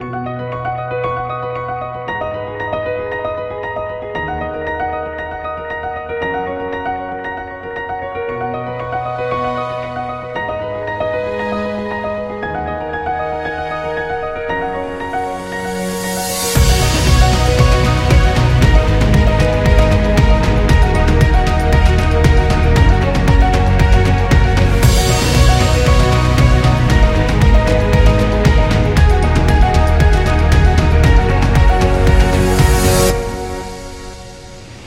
Motivating Inspiring

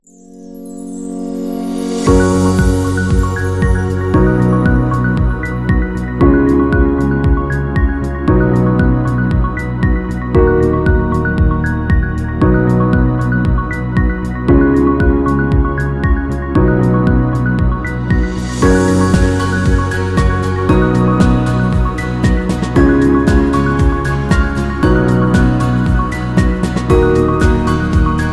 Medical Background

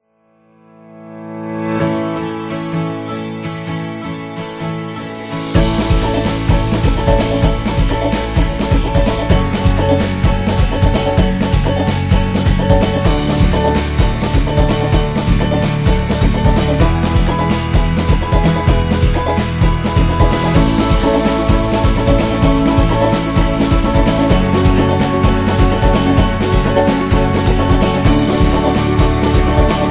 Celebration; happy kids

